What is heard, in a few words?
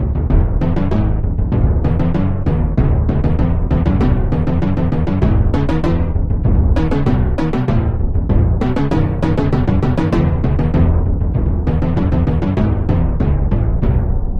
Drum; The Bard's Tale Remastered Trilogy